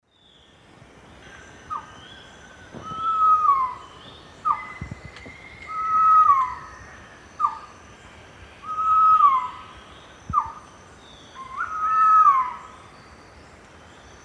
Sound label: Pharomachrus pavoninus, song; S. America; Eastern Lowlands